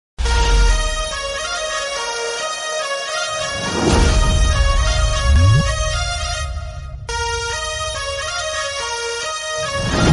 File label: BGM ringtone